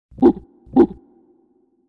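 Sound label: gorilla voice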